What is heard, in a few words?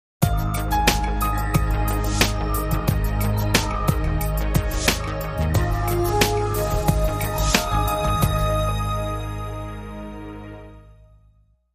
Moodbeat Logo